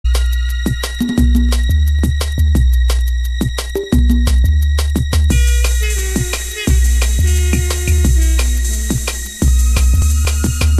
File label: Drum and Bass Jungle and Old Skool, UK Bass Radio